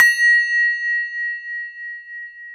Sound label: BELL A#, TOY HANDBELL; AKAI S-Series CD-ROM Sound Library VOL-7